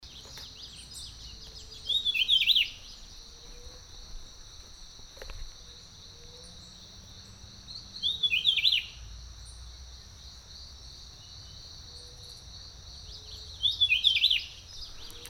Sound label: Rufous-breasted Wren, Panama; Las Mozas